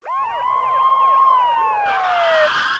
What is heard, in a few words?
sirens